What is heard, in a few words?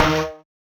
marimba steeldrum